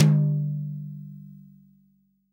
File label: TOM, REAL DRUMS, SWING KIT